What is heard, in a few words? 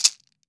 MARACAS; AKAI S-Series CD-ROM Sound Library VOL-5